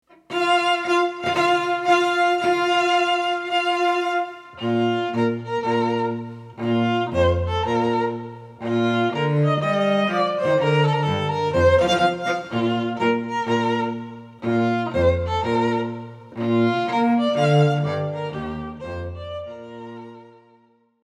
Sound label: wedding march; wagner